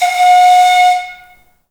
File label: AF. FLUTES; Heart of Africa; Spectrasonics; Heart of Africa 1